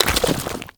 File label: Step Sludge; Footsteps; Walk; Warframe; Characters; Tenno